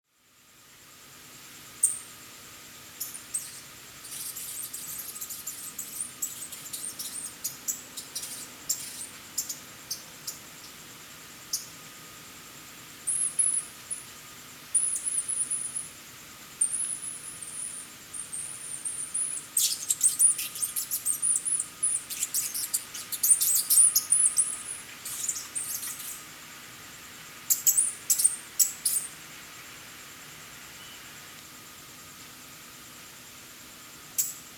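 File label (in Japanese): jakonezumi, Asian house shrew; itoman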